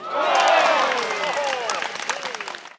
FOULE

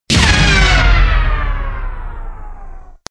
ECHEC; MOBICLIC

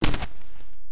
pas terre; WehikulCzasu; Time Machine; Bruitages

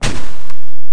pas kronos sable; WehikulCzasu; Time Machine; Bruitages

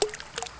plouf

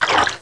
clapoti; MOBICLIC 14; europe

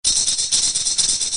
singe; MOBICLIC136

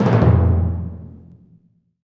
BOUM; Mobiclic 67

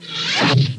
cracheur; Arc En Ciel Et Le Lagon Merveilleux; French

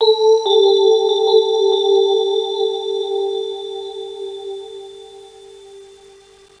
mettre perle; mettre perle2

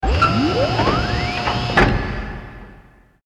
BRAS MECANIQUE

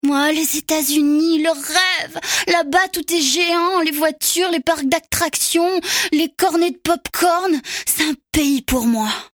bruit

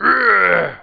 cri

